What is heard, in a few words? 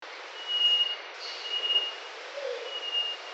roul roul partridge